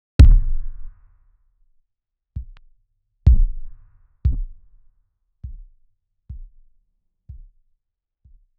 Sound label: tick tock light